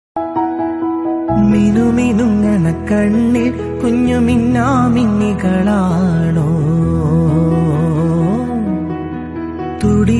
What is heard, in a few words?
Malayalam